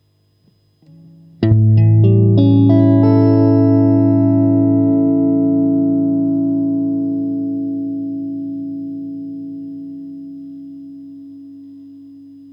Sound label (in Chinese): TEXASS OCC 单晶铜 清音 2档; kindman